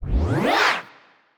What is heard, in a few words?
Power